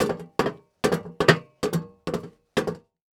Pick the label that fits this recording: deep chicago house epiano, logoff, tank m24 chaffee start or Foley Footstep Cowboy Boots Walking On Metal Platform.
Foley Footstep Cowboy Boots Walking On Metal Platform